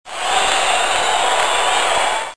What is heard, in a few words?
愉快 applause